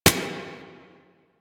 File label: Lasrian APC Turret Low Clip, Weapons; Warframe